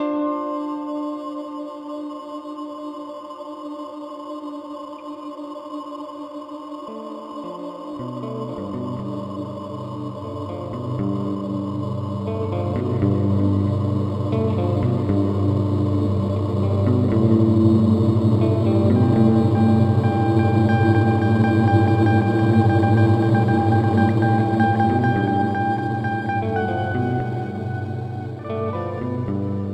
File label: Mooer R7 X2 Crush